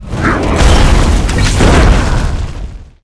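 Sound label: beatle king attack, monster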